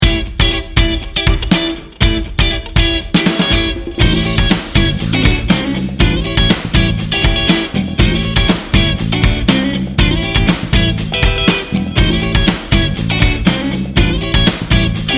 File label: funky and fun